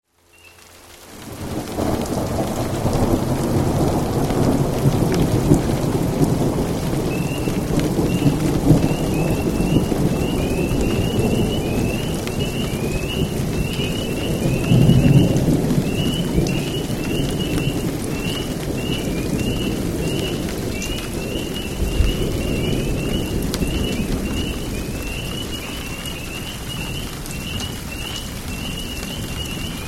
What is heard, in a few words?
Thunder Rain Spring Peeps Night Birds, Florence KY, Easter Night; Craig Wilson